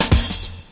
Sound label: reggae break, DRUM LOOPS and BREAKS; FILLS and ROLLS